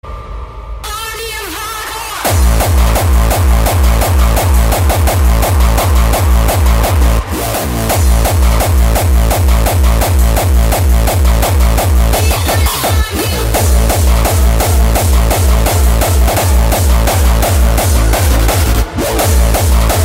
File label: Hardcore